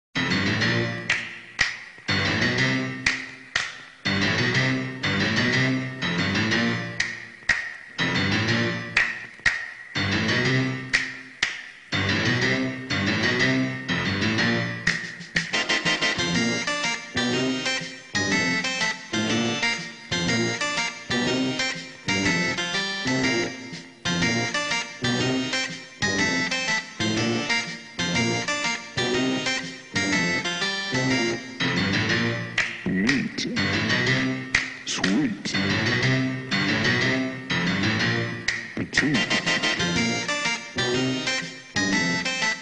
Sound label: theme instrumental